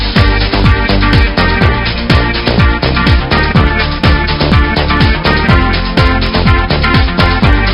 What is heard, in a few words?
music, loop